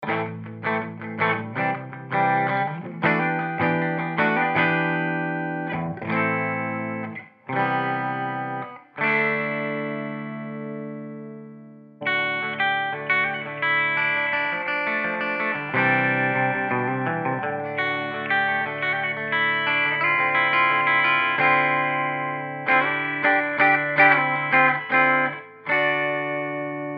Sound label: Anvil Bridge Clean